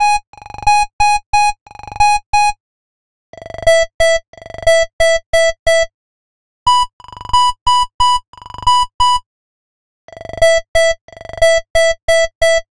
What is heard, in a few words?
ringtone